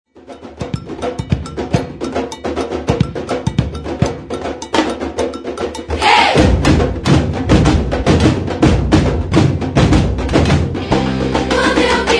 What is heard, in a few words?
maracatu